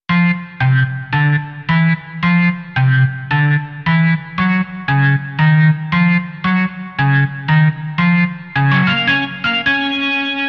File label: Organ Teasers